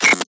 Button long

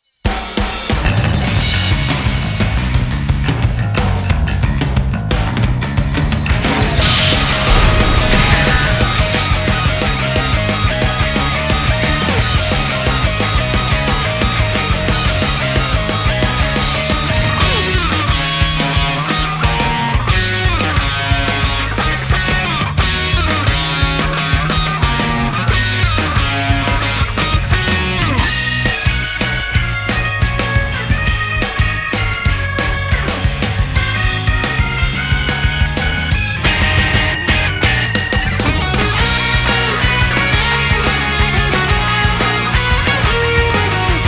Instrumental Version

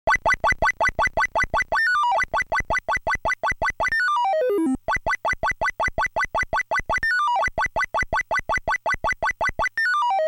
Stage BGM Stage Clear; Famicom 20th Anniversary Original Sound Tracks Vol.1

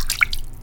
Fluid Impact Particule

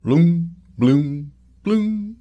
prize reveal giraffe; Prizes

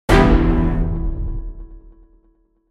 Exploration Stop 140bpm; project zomboid

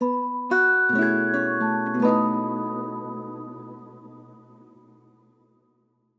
Quest Start; Chained Echoes

